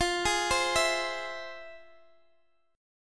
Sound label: F Minor Major 7th, Guitar, Standard; FmM7 arpeggiated; JGuitar